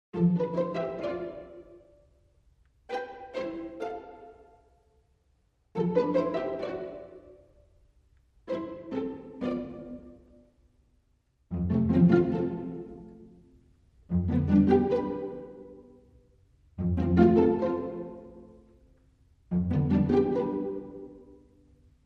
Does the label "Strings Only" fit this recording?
Yes